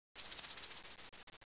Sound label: snake